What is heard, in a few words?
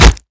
crossbow hit; Original TK Sounds